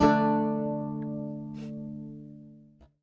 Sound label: Lute Performance; Lute Anim